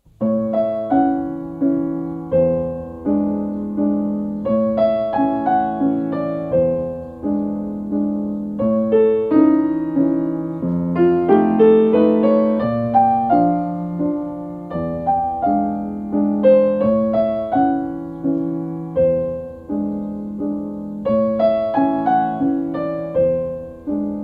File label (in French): Valse aux oiseaux